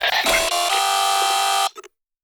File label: ringtone